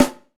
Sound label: round snare drum sample c key